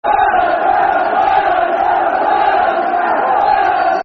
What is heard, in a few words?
Haway The Lads Fast, Football Chant (Soccer Song); Sunderland; Sunderland Safc